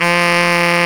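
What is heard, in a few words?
SAX Tenor growl; Tenor V-sw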